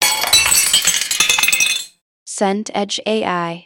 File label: smash ceramic